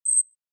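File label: Flash Beep Electronic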